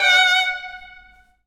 violin

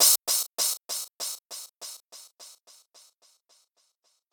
Dr Crash

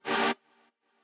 chord

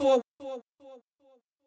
voice

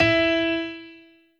piano

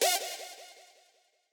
stab

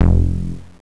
bass; Angelic Layer

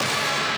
stab

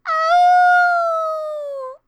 Awoooo; Momiji

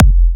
Kick

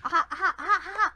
Aha aha aha aha

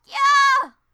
GYAAH; Alice

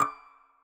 synth

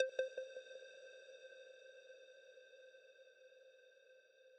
arp

